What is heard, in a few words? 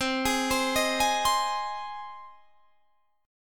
C Minor Sharp 5th C down; Cm#5 arpeggiated